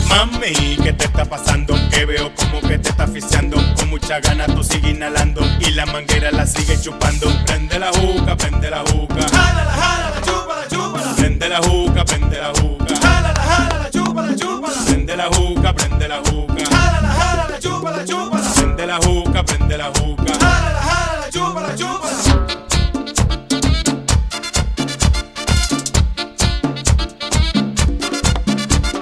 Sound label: Merenguemania